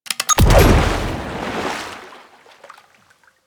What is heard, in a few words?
Fishing Capture Success; Warframe; Venus Fishing Spears